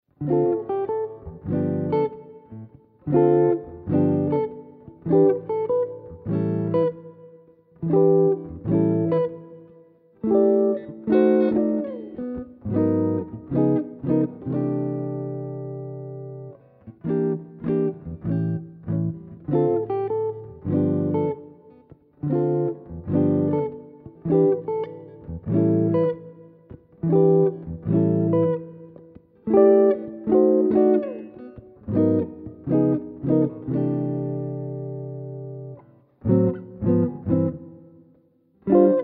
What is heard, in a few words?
Chord Melody; jazz tunes